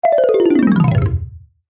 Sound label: cartoon descend fall mallet